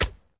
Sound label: squish